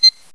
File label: acid trap detonate